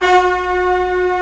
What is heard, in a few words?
ORCH C0 PP, Orchestra; Sound On Sound; Peter Siedleczek's Orchestra